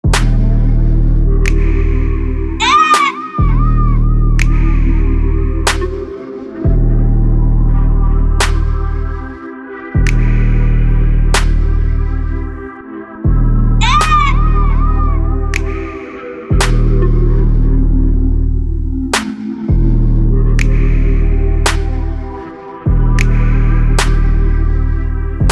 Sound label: Flap, beat